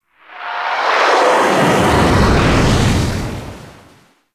Ethereal Void Angel Weapon Projectile Whoosh By; Abilities; Warframe; Zariman

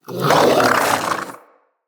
Vocal Alerted, Hunting Critters; Characters; Predasite

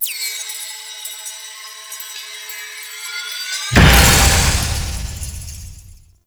Despawn; Warframe; Duviri; Dax

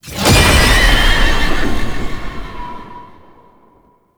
Unyielding Gallop Impact; Warframe; Dagath

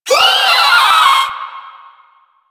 Volatile Runner Kamikaze Scream; Enemies; Abilities; Warframe; Proto Infested

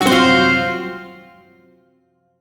Shawzin Hirajoshi Chord; G; Warframe; Duviri

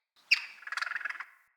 Squirrel Idle Chatter; Encounters; Animal Rescue

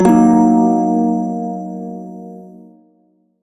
Shawzin Blues Chord; Warframe; Prime Shawzin

